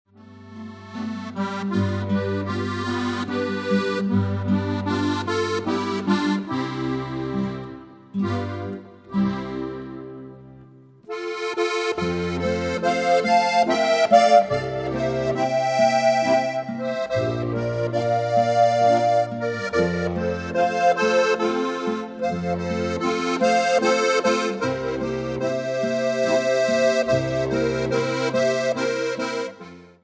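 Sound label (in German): zillertaler harfenländler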